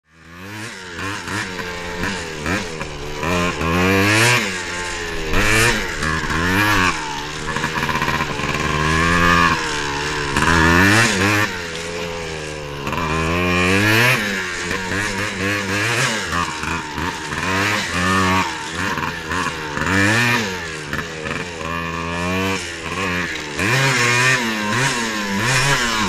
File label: Constant Scrambles M, dirt bike 100